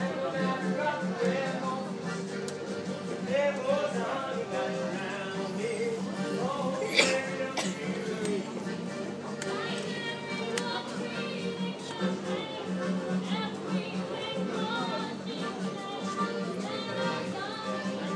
folk singers

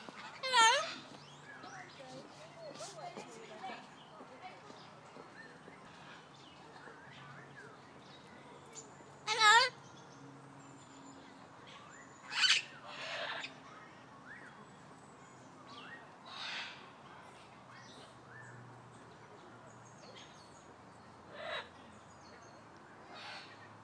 amazonian yellow breasted parrot saying hello; called charlie

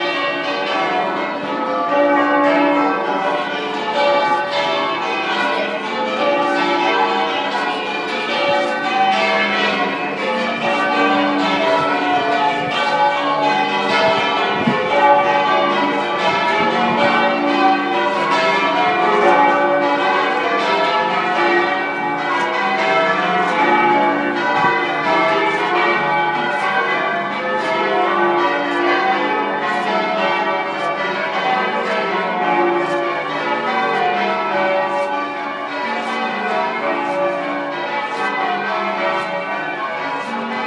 church bells, Cardiff; St John's Church

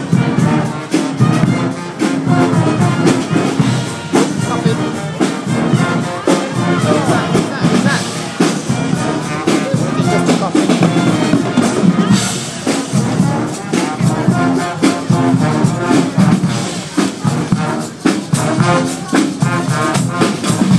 bonfire brass

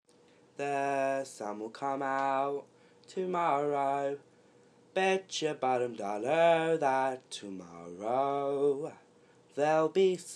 character song

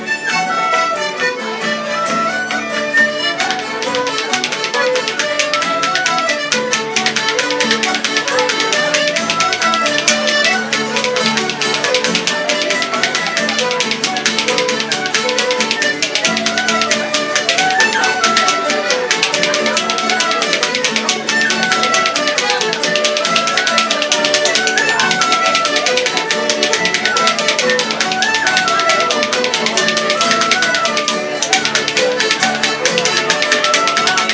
kerry polka; at guerin s

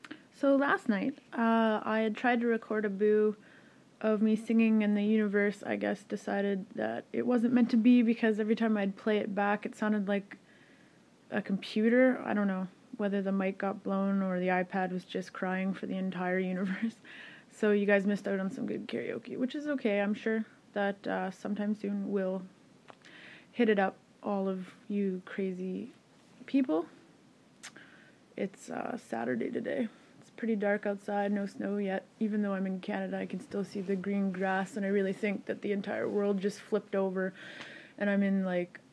crying; universal

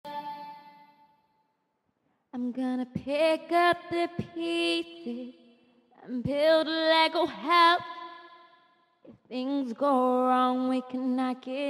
singing a bit pitchy but still too cute

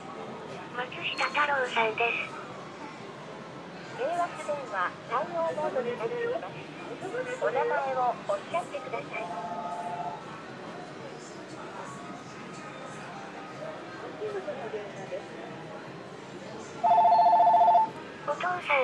fax

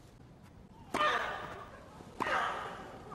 tennis grunt

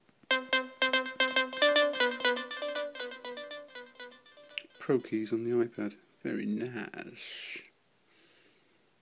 pro keys

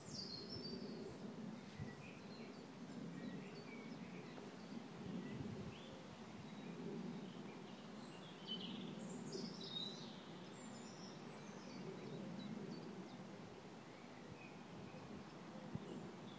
bank holiday train station atmos

